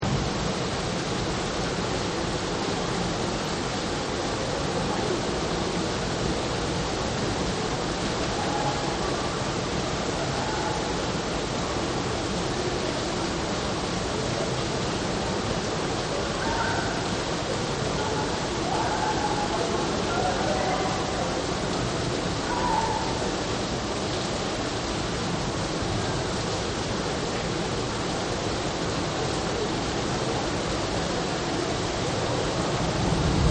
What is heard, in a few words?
Rain and Thunder; Weather; Partners In Rhyme